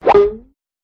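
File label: cartoon hit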